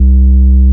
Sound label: FARF C1, FARFISA